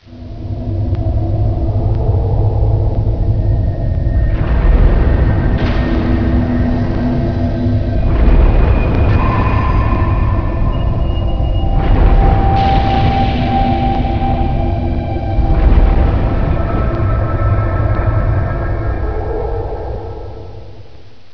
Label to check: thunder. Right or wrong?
Right